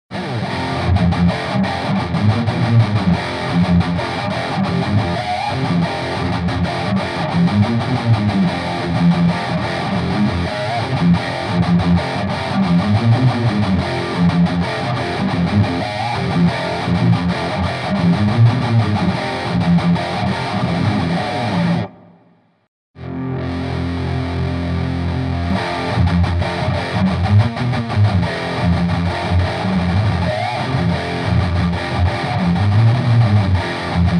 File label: 5150 bugera test